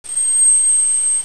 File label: trumpet